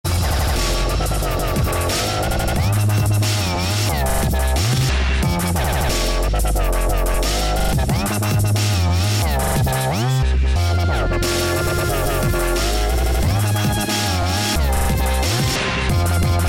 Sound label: fast drumstep